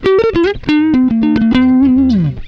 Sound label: SOLO LICK, Gtr